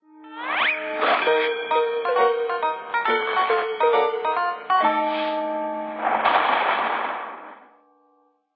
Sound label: Old Man 8bit